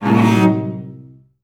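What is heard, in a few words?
cellos D; Best Service; Peter Siedlaczek's Advanced Orchestra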